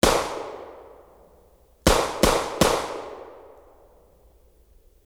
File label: Pistol